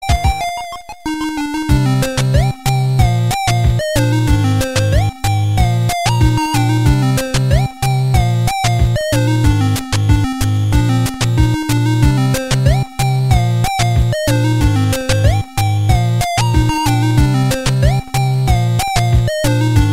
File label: 8bit